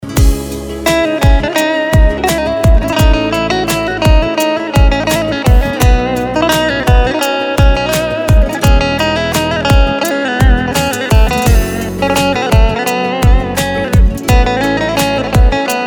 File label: Mobile ringtone